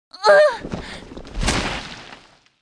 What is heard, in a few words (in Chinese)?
女 死亡 倒地